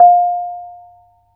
BONANG F5; Spectrasonics; Heart of Asia

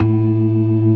CLASSIC C3, Synth and Organ

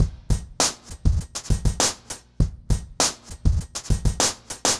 Remixed Drums 100BPM; The Roots Drumloops

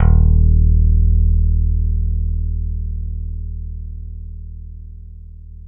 PX PICK D#1, Bass; Masterbits CLIMAX 7; PX BASSES

